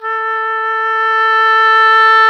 oboe, Roland; Oboe Dry; Roland L-CDX-03 Disk 1; Oboe 4-8

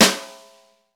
snare, Roland

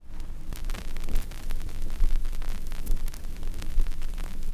X CRCK R, RECORD-FX; XP RECORD-FX; Best Service; XXL Production Effects

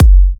F# kicks; markus hakala kicks

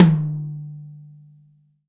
tom, Real Drum Kit, funk kit; Best Service ProSamples vol.43 - Real Drum Kits

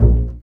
BA PIZZ, Orchestra; Best Service; Peter Siedlaczek's Advanced Orchestra

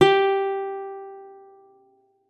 G4, GUITAR; Xsample VOL-2

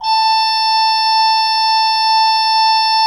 HGRP A4, Cathedral Organ, HOLZGEDACKT; Propeller Island

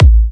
Kicks A; markus hakala

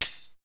Tambourine; ZERO G - Datafile DISC 1

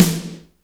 GATE SNARE

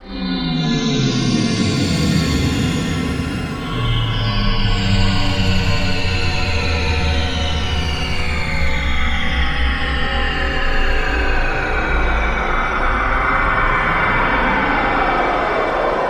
Atmo FX

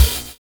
HORROR; Univers Sons - Kitch 70s

